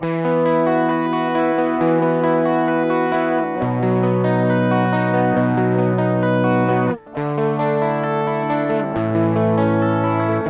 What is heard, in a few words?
bridge Clean